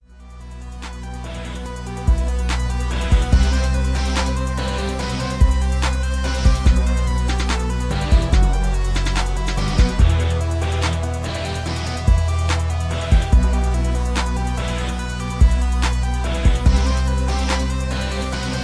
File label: 72BPM